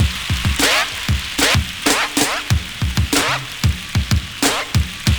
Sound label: RAIN BEAT; Univers Sons - Kitch 70s